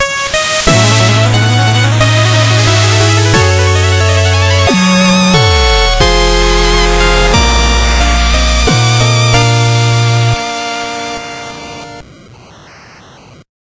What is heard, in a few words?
Music Redemption; Shovel Knight King of Cards